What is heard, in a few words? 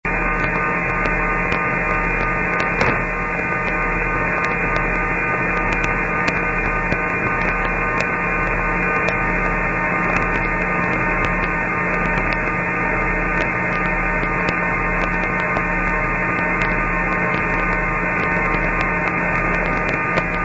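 medium frequency tone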